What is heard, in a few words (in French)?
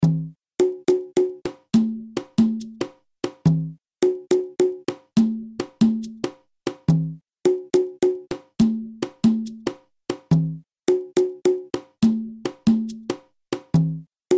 guarapachangueo cajon lent